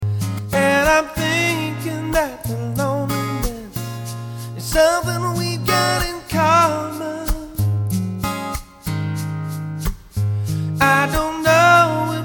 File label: Ballad Perc